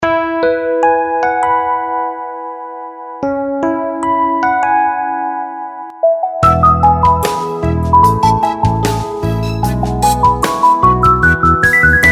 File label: ringtong; Gionee V185